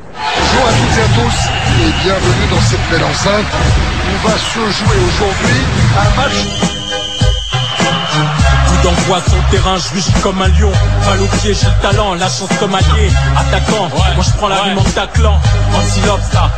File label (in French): Chanson de foot